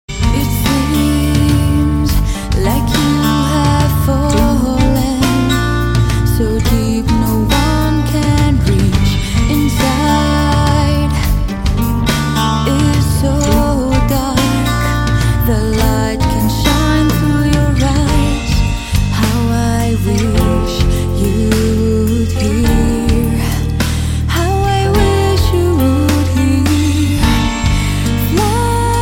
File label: a soft whisper